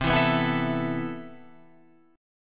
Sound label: C Major 13th C down Guitar Open F; CM13 strummed; JGuitar